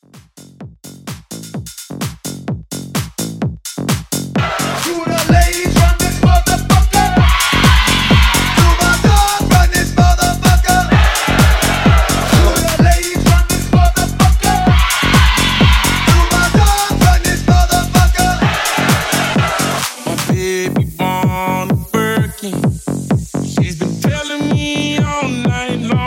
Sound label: Tech House